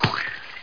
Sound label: slime